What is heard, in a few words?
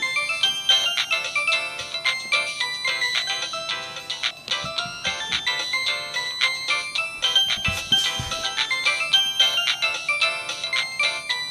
Ringtone; Samsung